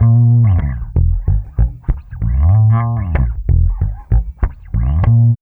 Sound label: 89.3 BASS